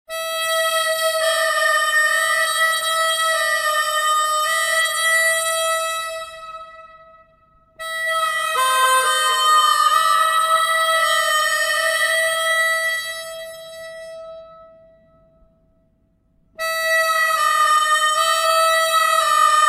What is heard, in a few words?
chill to death; svencoop; source of life